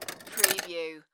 Pinball sound, roll on rail and hit metal gate

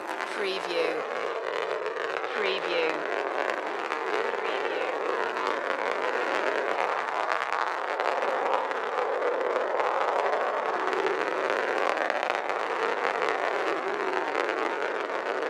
rolling marble

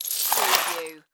Debris Glass Sweep

